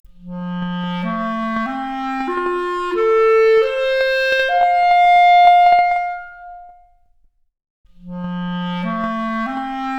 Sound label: Clarinet arp, Stereo output noised; 3bit